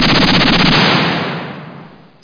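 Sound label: machine gun; MPG-Net (USA); garden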